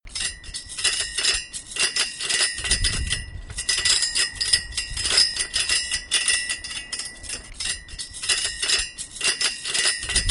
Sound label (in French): Bruit sur grille